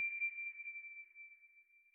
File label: sonar Tail Water Far; Barotrauma; Real Sonar